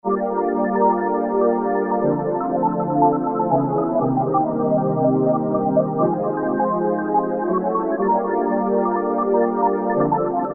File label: pad Star Seeker, E-mu PK-6